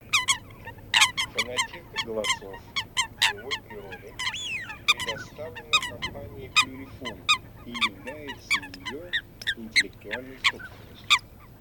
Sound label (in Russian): Лысуха Folaga; plurifon